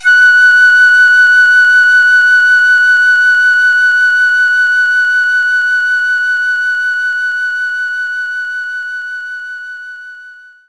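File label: Piccolo F#2; knights try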